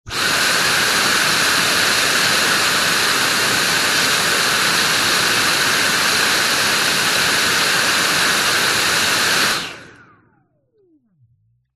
household vacuum cleaner stick suction burst close to end of open hose; dyson v7